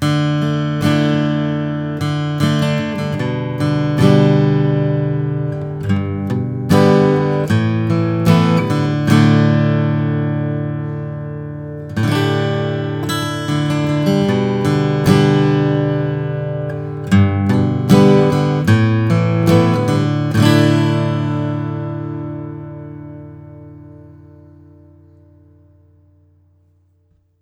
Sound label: martin 000 28 natural; norman blake